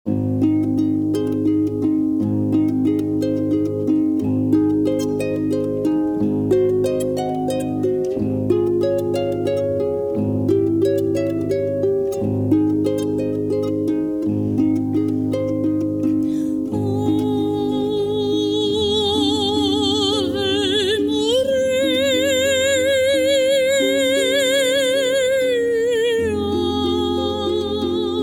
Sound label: harp vocals